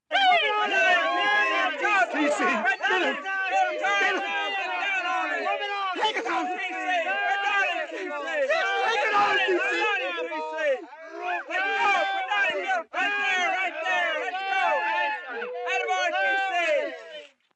Small Group Men Cheering Encouraging

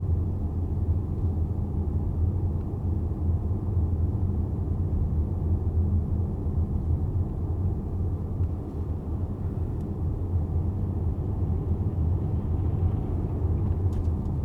Car Interior Driving

